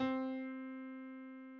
Piano Mid

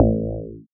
FM Bass

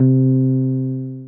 Bass C

